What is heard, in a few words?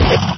shift down